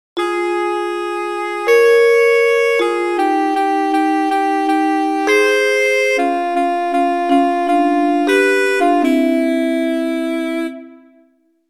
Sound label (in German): Contrapunkt in 3rd